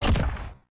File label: hit; EMXArms